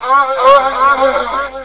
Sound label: Head Death; BOOM